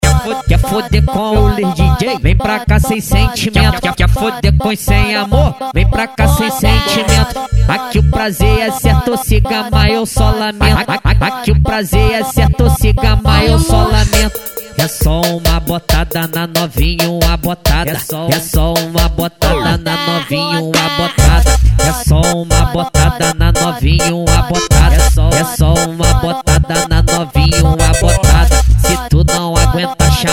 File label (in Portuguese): funk ritmado; cuiaba mt